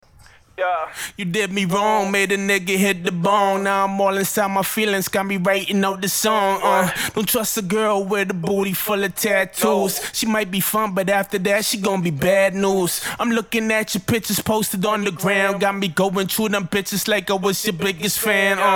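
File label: hip hop 163 G major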